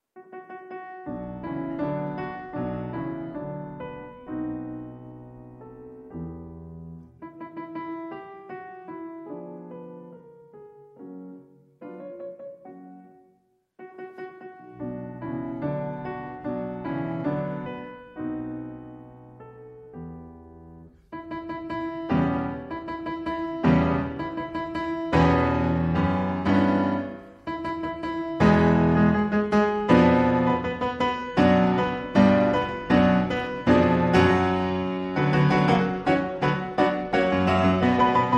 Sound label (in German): Klaviersonate h-moll; Klaviersonate h-moll, Op. 5 I - Allegro molto appassionato